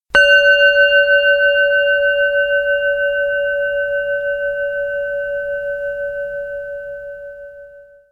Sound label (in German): Klangschale; Merkur